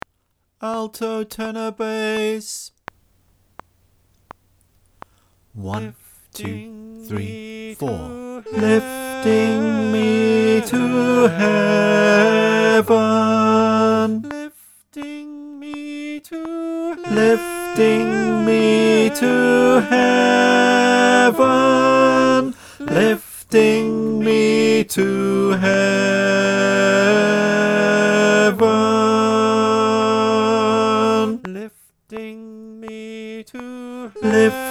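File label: tenor click track, choir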